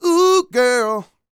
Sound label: SING, Vocal Planet R&B & Dance; ILIO